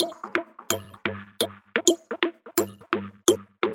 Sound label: Percussive Loops 128BPM; Vengeance Samples